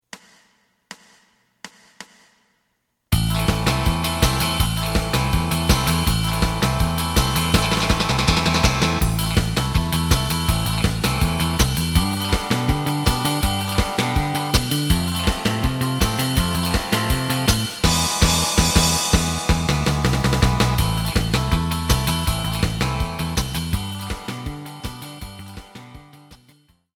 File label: lead